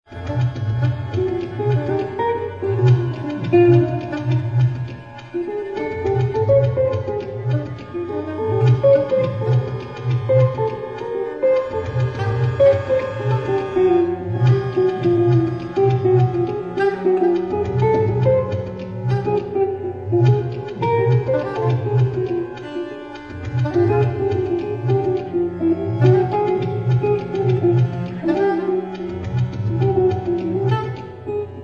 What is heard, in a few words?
free sound ensemble; multi sonic fest